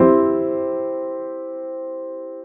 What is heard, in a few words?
chord C